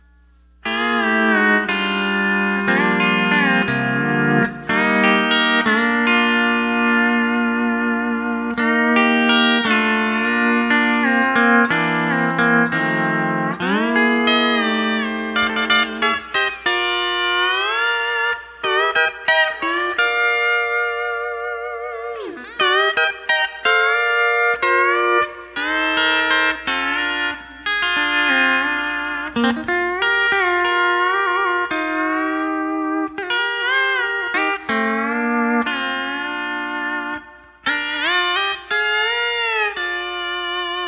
Steel Only

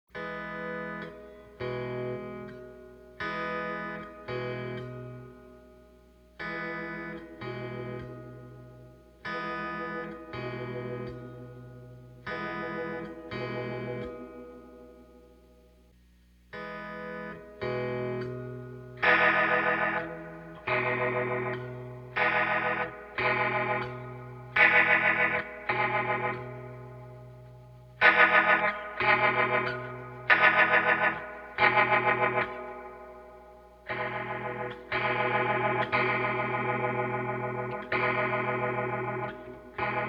Lo Hi Only Full Range, Neo Ventilator

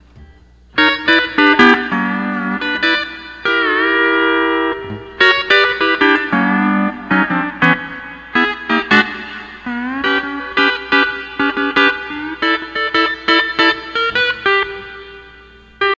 12 String Lap

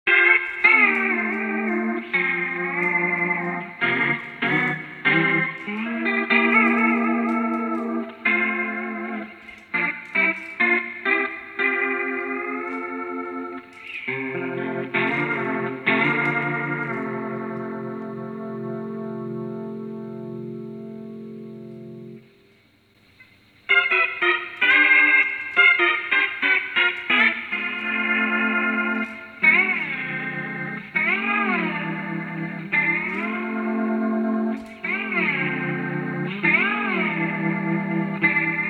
Quilter Labs Travis Toy 15 200-watt Combo Amp; TT15 FRF

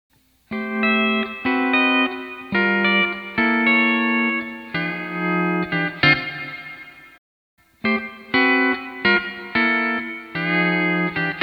D13 Pedal 8 Drop String 10

